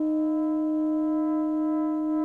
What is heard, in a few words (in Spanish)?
Multiarmonicos